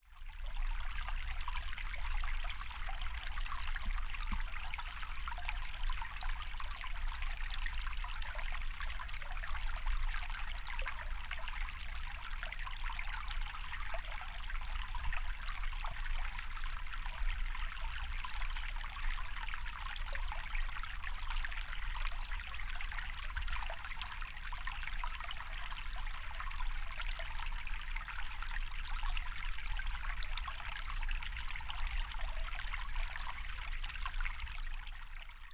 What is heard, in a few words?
Tiny Forest Creek